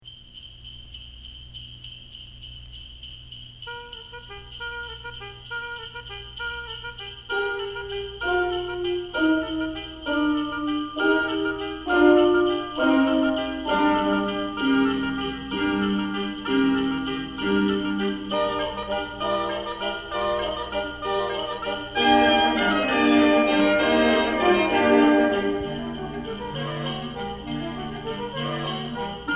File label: Carol Bells; H Bowl